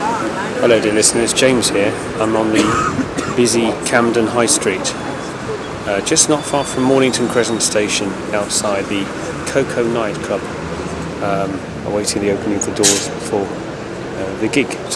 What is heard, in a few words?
outside Koko on a busy and noisy Camden High Street